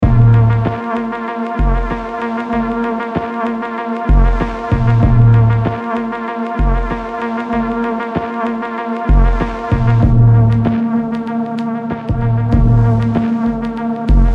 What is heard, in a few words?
granular static